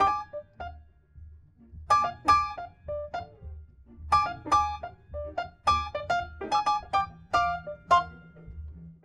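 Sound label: Trad Jazz Piano F major; Grand Piano; Digidesign; Pro Tools Essential Loops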